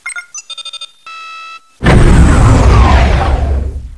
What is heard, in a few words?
Core Eject